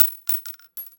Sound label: casing bounce hard